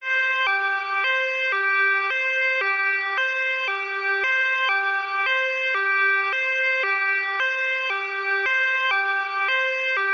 police siren european designed

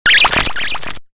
sound design glitch lo fi

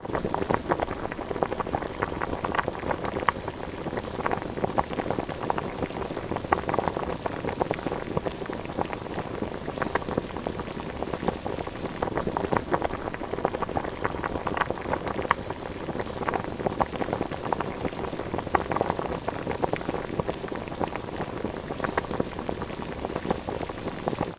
food rice or potatoes boiling in water frothing; sound effects one

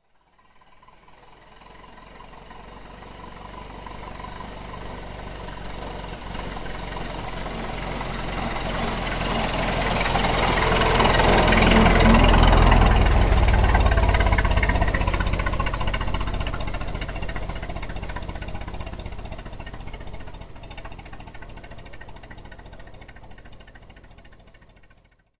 transport car model t ford pass by; martinimeniscus

